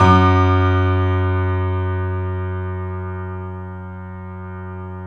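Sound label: piano, Yamaha F#1, L; InVision Interactive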